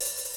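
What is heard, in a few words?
SP1200 kicks; Rubber Chicken Software Sample Bank; DRUMS + PERC; EMU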